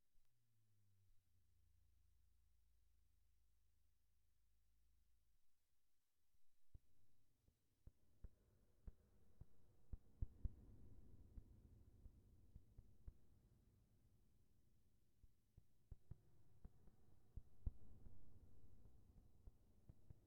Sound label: long hollow noises